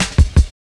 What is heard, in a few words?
Cymbal Rise Cut, Drum Loops 165.5; Zero-G Jungle Warfare 1, 2 & 3